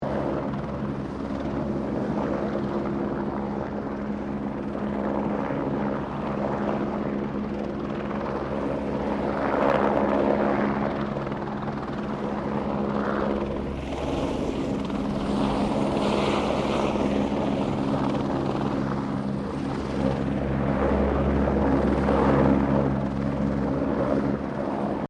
HELICOPTER BELL 204 FIREFIGHTER HOVERING MEDIUM, Arial firefighting, small bushfire; Helicopters